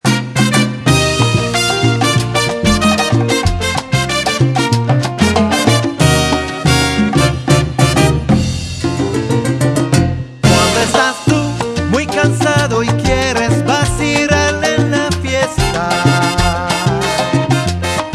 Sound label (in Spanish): Carcajada